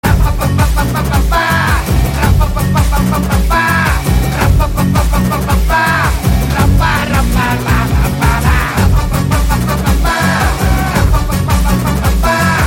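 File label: Theme background music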